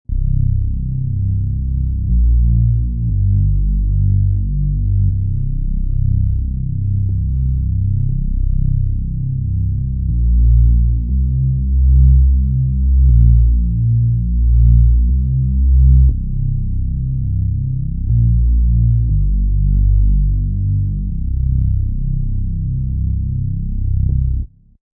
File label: Deep n Creepy; High quality stereo deep and creepy background sound excellent for evoking fright and fear, perfect for horror-thriller genre or video